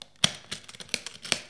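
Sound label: box open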